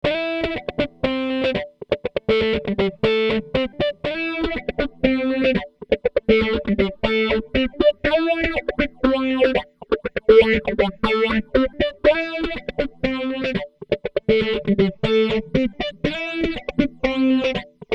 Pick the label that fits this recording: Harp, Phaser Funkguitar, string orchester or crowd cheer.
Phaser Funkguitar